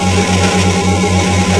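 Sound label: computer; dn3d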